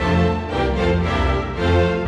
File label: Strings; Sonic Foundry (Sony Creative Software); Orchestral VOL-2; Modern 31